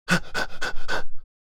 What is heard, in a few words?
Man rapid breathing